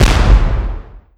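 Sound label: grenade launcher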